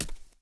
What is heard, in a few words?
Knights Templar Walk; Playable Tutorial Level